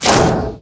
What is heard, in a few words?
swarm scroge missile hit; weapons; Stellaris